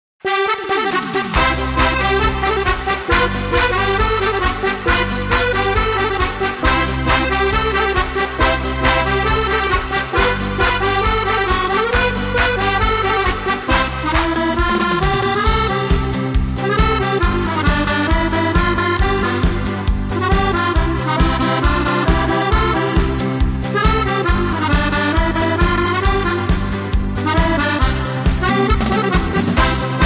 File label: Tango solo